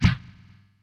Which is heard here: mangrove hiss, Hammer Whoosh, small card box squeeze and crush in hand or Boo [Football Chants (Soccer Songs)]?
Hammer Whoosh